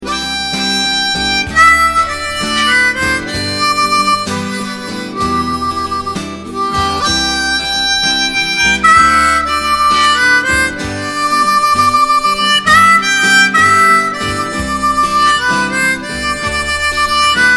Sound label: harp